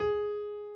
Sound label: key; keySounds